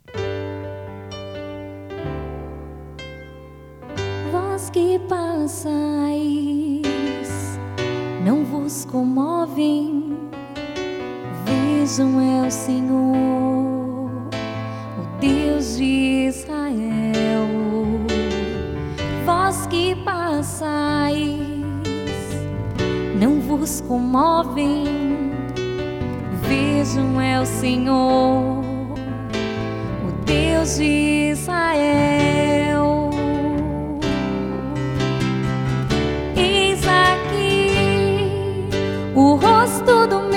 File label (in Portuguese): canto